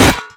robo step, footsteps; Garry's Mod; tf2; mvm

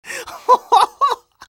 laugh happy

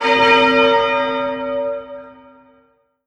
belltower; Garry's Mod; tf2; rottenburg

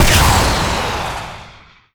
airstrike fire; tf2

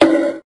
bonk; tungtung cursed; tungtung sahur curse

